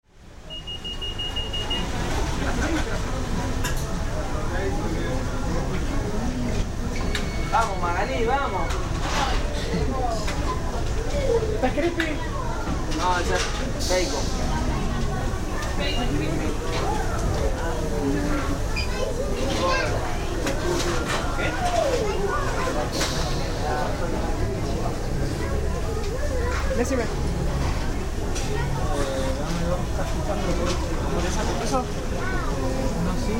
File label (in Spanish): shopping open mall local mac donalds; godoy cruz; palmares